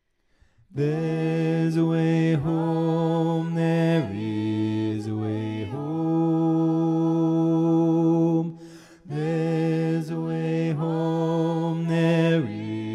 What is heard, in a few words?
Chorus Bass